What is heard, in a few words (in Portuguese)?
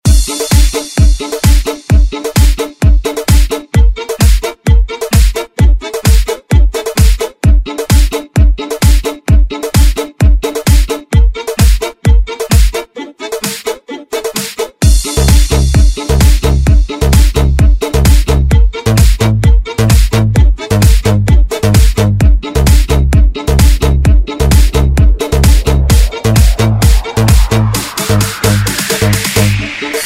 eletro funk e sertanejo